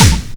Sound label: hit, percussion hit